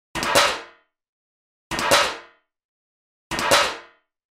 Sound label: metal tray; افتادن; افتادن سینی فلزی